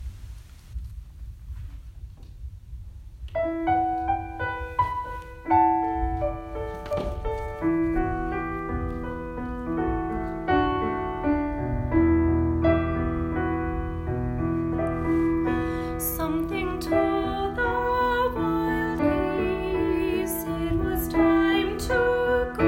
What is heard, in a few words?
something alto